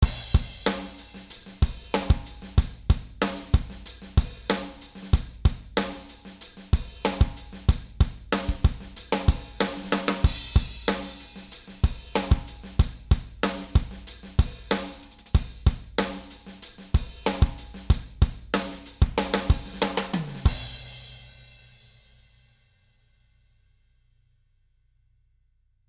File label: Sample groove